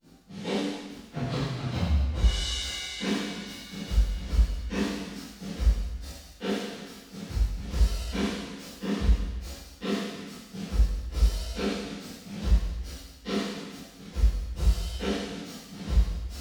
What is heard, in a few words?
Drum bus